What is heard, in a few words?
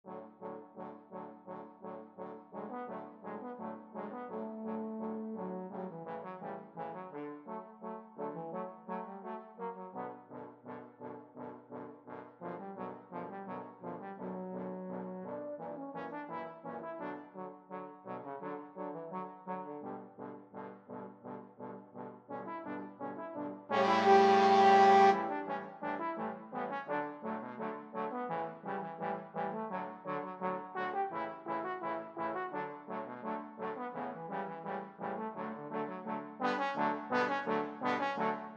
trombone quartet